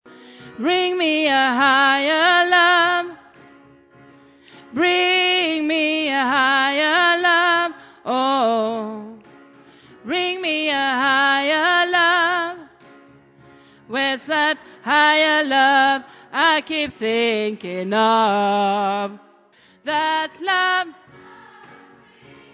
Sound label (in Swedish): alt, stammor